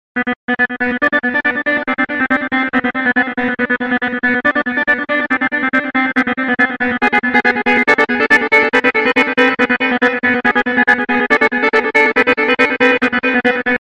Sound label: Trance Gate